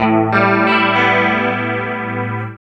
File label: GUIT; East West - Phat & Phunky